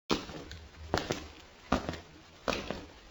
pasos

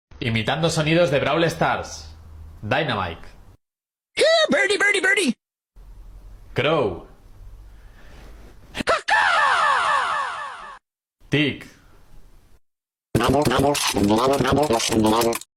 imitando sonidos; brawl stars

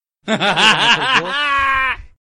risa; negro sabio

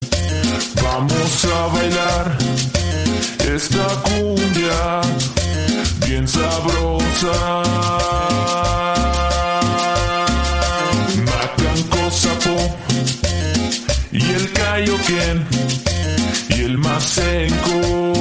cumbia; makankosappo

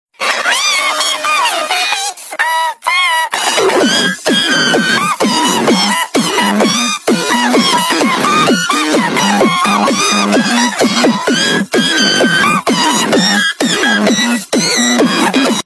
musica random

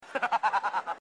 risa; atletas de la risa

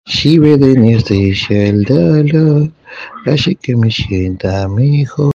cantando

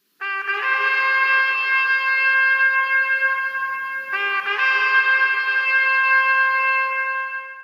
trompetas angelicales

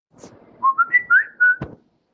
samsung silbido

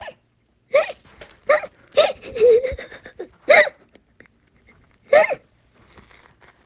risa

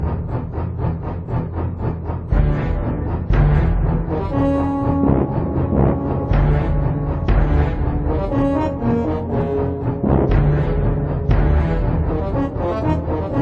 music; tiburon

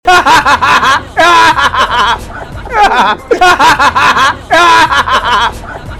risa

